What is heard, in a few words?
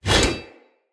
Whoosh, Swords; Weapons; Mockery; Turash